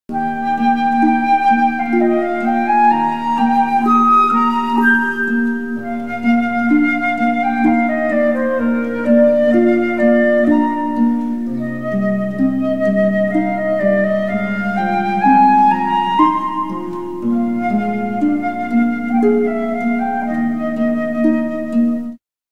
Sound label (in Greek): Φλάουτο Arlesienne Suite No 2